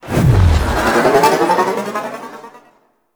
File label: Ship Boost; RailJack Ship